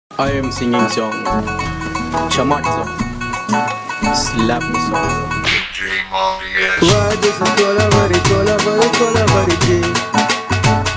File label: Dhol Mix